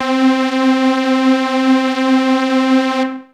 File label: 303-Style single hits